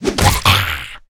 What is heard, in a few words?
zombie whit; DeadFright